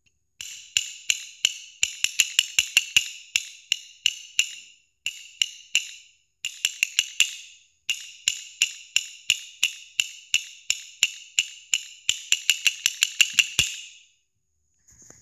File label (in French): Vocal castagnettes; vie de l'ecole